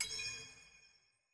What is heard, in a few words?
click; tft promethium; level select chosen